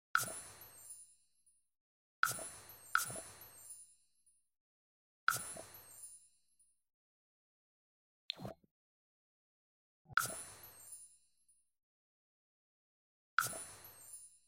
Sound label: menuing; sims 4